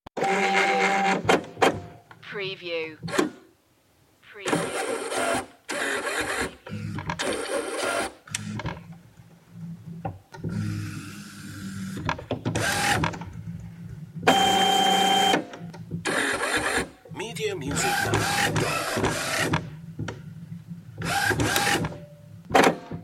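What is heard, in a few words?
Printer On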